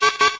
science program error, windows 9x sound schemes

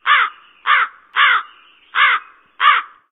crow, animal; ambient; Left 4 Dead 2; Source Engine

